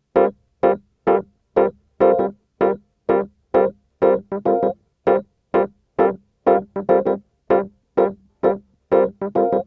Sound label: Smooth Groove Rhodes, 70s Analog Funk